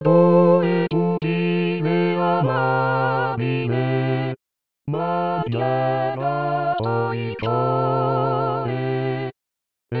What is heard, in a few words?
tutti; W Amadeus MOZART; KV 439